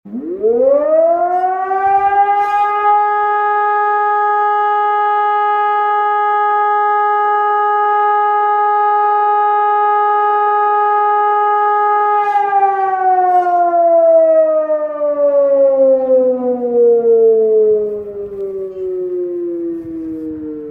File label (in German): Sirene Feuerwehr ECI600 Mast